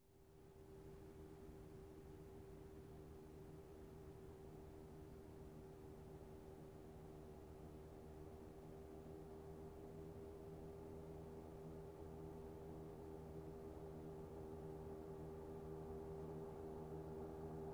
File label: Motorcycle approaching, turning off